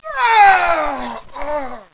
death

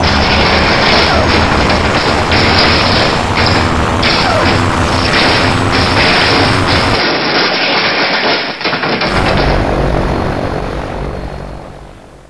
motor in trouble

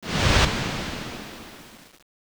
spy cloak

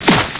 ground recover

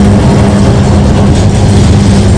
train door loop

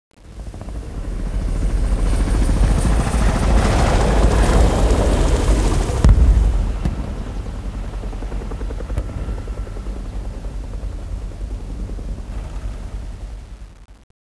copter flyby

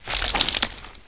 stream

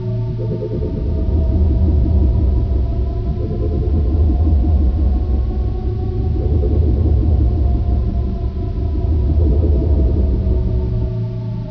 generator

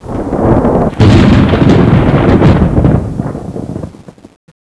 thunder close

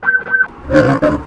hunter idle; hl2

